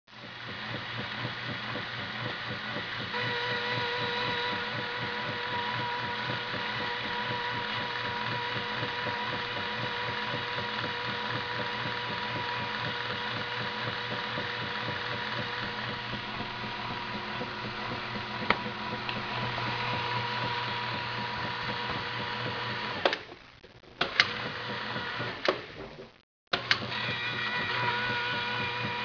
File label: pump, Washer; Washer Repair; dd Washer